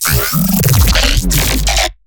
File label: glitch stab; Virtual Riot; Glitch Stabs